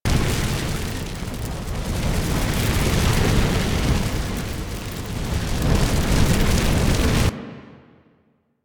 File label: Firelight Loop; Props; Warframe; Entrati